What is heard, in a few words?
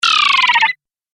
Arcade Game Very Fast Beeps; Video Game Sound Effects; Retro Inspired Sound Effects - Think Nintendo, Atari, C64, Etc.